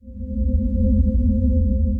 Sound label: Barely Breathable, Ambient & Evolving; Ableton; Glitch and Wash